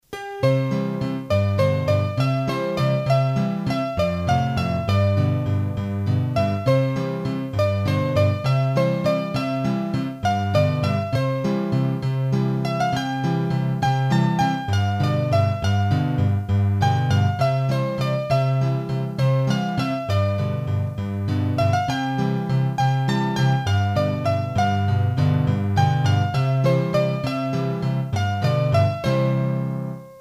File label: Valses, hurdy-gurdy; Belle Bergere